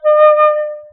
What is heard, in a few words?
flute synth